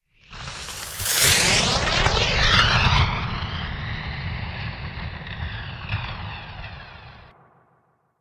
zvuki meteorita asteroida

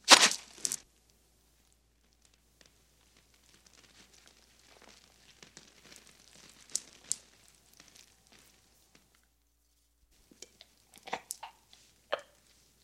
zvuki pererezaniia gorla

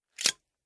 zvuki politseiskoi dubinki

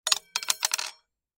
zvuki viazaniia

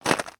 zvuki shagov po ldu

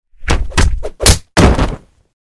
zvuki draki liudei i udara kulakom

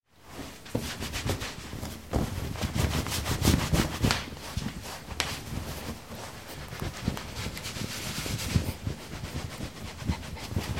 zvuki vafelnogo polotentsa

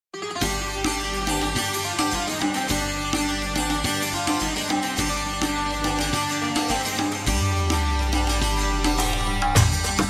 arabskaia fonovaia muzyka bez slov

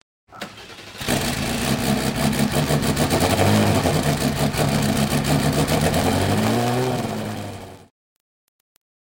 zvuki startera avtomobilia

